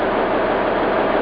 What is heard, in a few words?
SUB IDLE